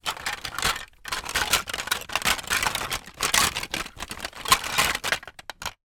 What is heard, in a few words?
toy marble run tracks lose movement box